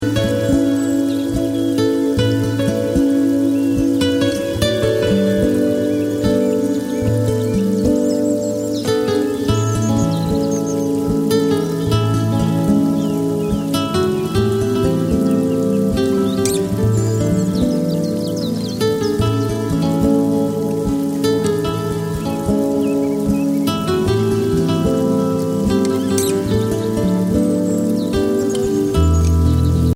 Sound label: River Lullabye